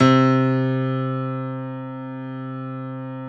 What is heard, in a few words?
C2 YAMAHA, GRAND; NS GRAND